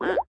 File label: duck Hover; scruffy duck